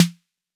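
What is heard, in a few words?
808 Snare; Roland MV8800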